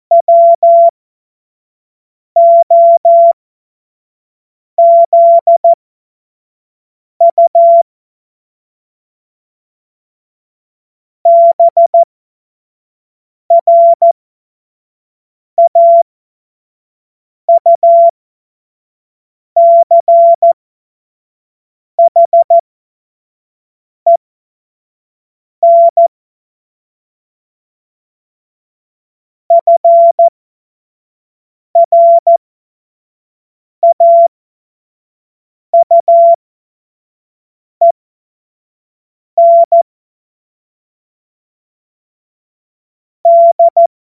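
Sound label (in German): Witz, cw morsen; Morsekurs; Witze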